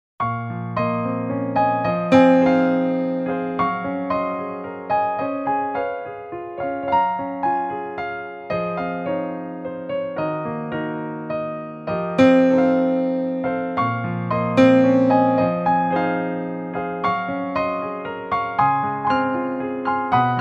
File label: piano; クラさん(電子ピアノ)